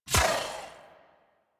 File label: Dodge Impact; Warframe; Warframes; Pacifist